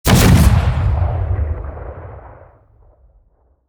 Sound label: fire dist, rocket; gmod; arccw uc commons attachments